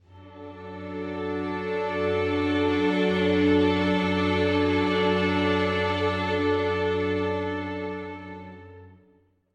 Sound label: Loop String Swells Dm 1st Inv